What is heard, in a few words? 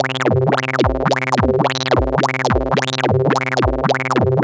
Noise, LFO & Noise